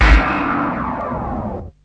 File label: powerdown; Weird and Interesting Hits; single hits